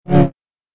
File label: Double Bladed Saber Sound; FXHome